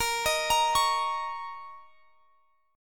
A sharp Minor Double Flat 5th, A sharp, down

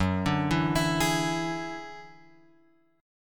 F sharp 7th Suspended 2nd Sharp 5th F sharp; F#7sus2#5 Chord {2 5 2 X 5 4} arpeggiated

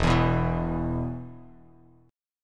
E 5th, Bass Standard; strummed; JGuitar

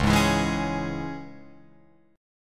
C sharp Minor C sharp Guitar Open F, chord; strummed; JGuitar

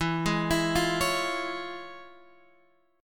F Minor Major 7th Sharp 5th, E

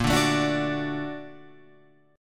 Bb Augmented Major 7th Bb Guitar Open F, chord; Bb+M7 strummed; JGuitar

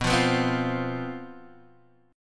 C Major 7th Suspended 4th, guitar

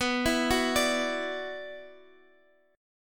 F sharp 7th Suspended 4th Sharp 5th B

